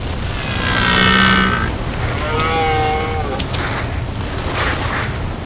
grind giraffe; terrains; Tony Hawk's Project 8; XBOX

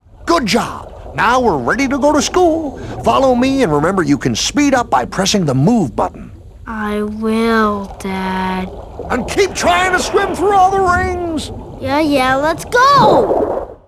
ring; english

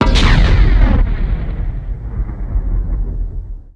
disruptor cannon impact; Star Wars Clone Wars; XBOX; Xbox Live Effects

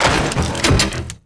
droid get Up; droids robots

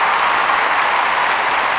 Amb Crowd; Cars; XBOX

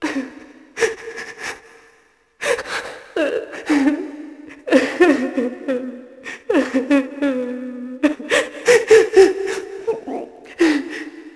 crying; nightmare

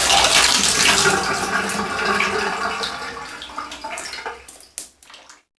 shower stop; toilet; Max Payne

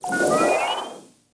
powerup full Health; interface

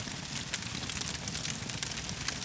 fire small

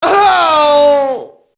mobster death; Max Payne

